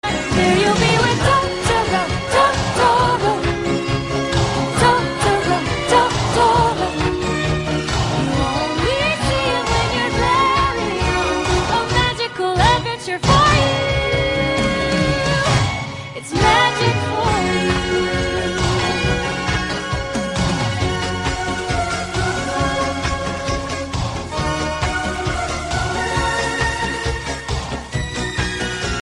theme song call tone ringtone; totoro

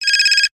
phone text ringtone, sms

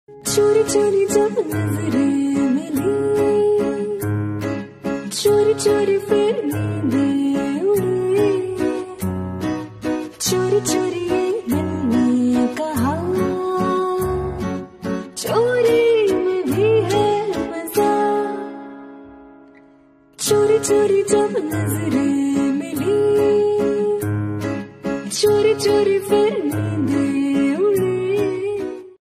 unplugged ringtone, bollywood

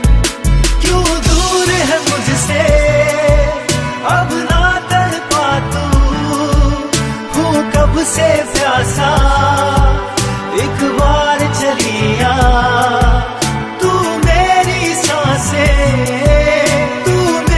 bollywood ringtone

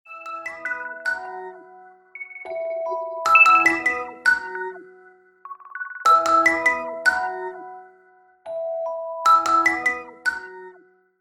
attentive ringtone, iphone

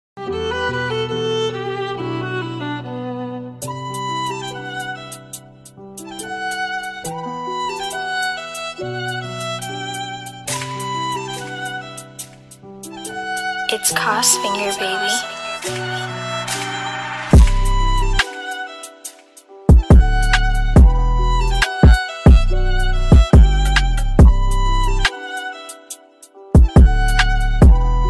instrumental uk drill ringtone

